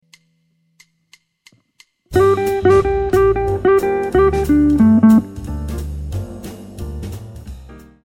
lick, jazz guitar

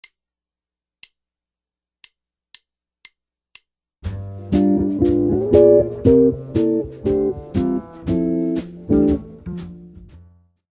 Chord Licks, jazz guitar

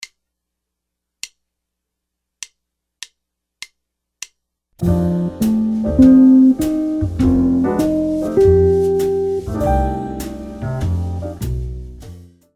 lick, jazz guitar

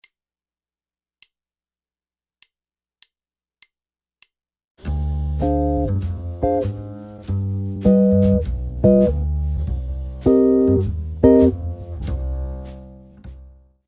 jazz chord progression, guitar

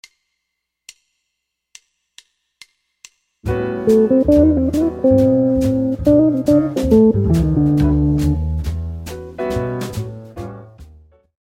dominant guitar lick

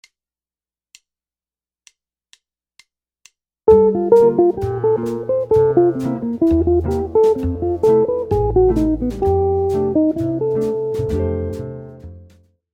lick, jazz guitar

